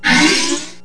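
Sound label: hp pickup, items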